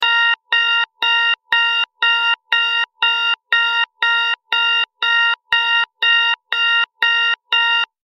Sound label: Long sms; HTC ONE